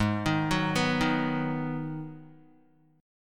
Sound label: Ab Minor 7th Ab, Guitar; arpeggiated; JGuitar